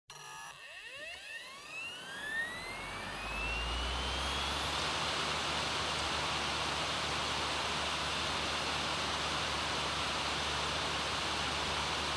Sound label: Optical Disk Drive Operating Noise